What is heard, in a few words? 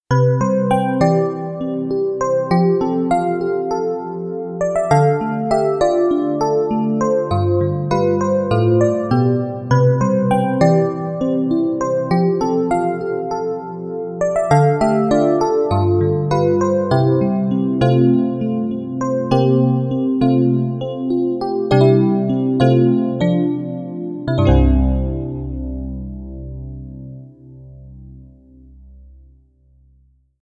Electric Piano